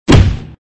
low impact

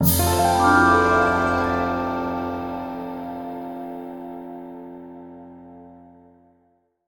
stinger new perk; roguebook

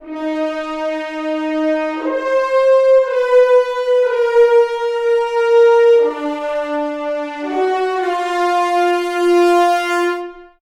String; The Bureau XCOM Declassified

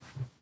tools sledge; teardown; ps5 windows xbox series xs

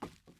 wood step; teardown; ps5 windows xbox series xs

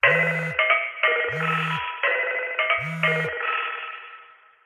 Cellphone Ring; Vera

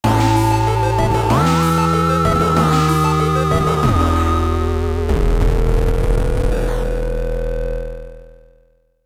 You Lose; Orion Trail

